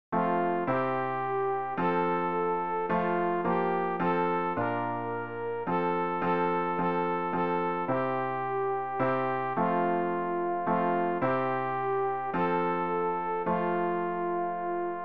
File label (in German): sopran; Ref. Gesangbuch; RG 585: Gedenke unser